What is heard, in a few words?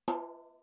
Djembe